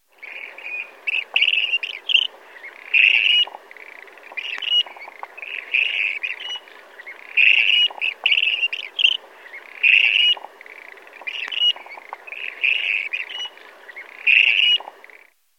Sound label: Clark's Grebe, Birds